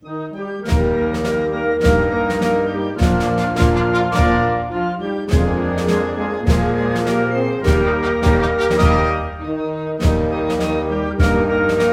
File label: Hino Nacional Instrumental; Angola; simbolos nacionais